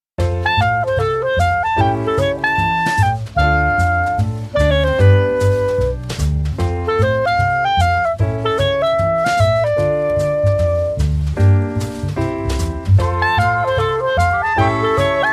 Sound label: bgm; taptapstar